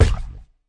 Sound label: fx drum